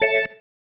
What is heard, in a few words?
Stab, Organ, One Shots